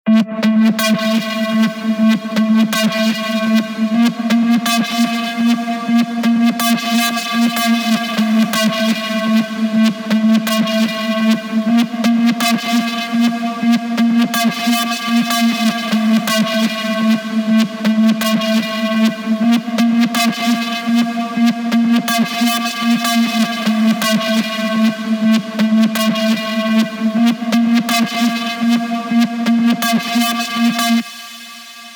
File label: Melody Bounce 124BPM; Melodies